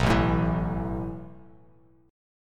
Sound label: A Suspended 2nd A Bass Standard; strummed; JGuitar